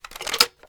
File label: ar gl reload fast shell eject; weapons; gmod; viper; scharlie